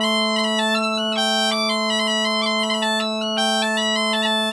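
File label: bagpipe 90, Celtic Flavours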